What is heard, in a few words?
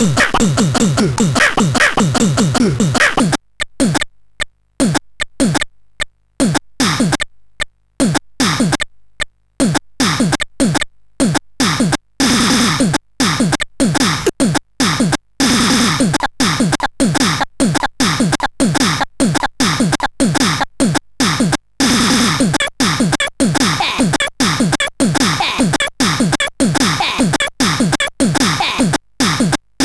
Micro vocals, MOS6581R3